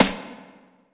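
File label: snare drum, Drum & Bass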